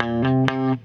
HEY, Roots Disco; USB Soundscan vol.32; AKAI

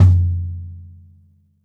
bop kick - snares on; JazzFunkKit

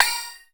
BELL-GONG, A#; Big Fish Audio

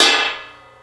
Nasty Ride Choke, Cymbal; KJ Sawka; Drum n Bass & Beyond; Drum Tool Box

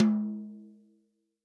TOM, Real Drum Kit, POP KIT

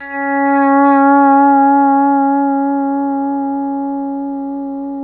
PEDSTL SWELL C#4

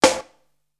cuttin, snares; Zero-G - Funky Elements

